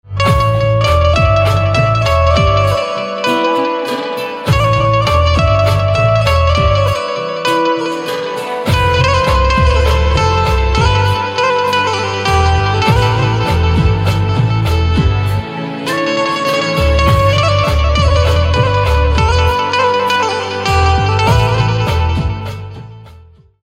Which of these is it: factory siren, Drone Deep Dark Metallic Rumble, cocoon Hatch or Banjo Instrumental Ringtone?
Banjo Instrumental Ringtone